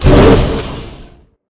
Jump; Aureus

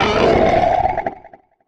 Vomit

